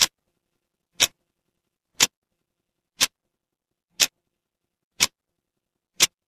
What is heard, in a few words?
mechanical clock tick